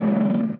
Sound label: DIE; mahlendur